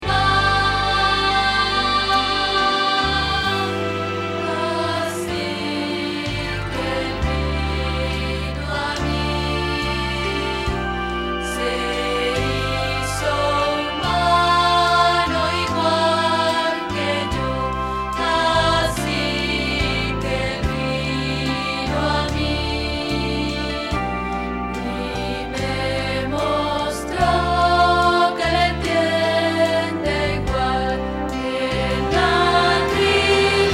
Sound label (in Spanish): Contralto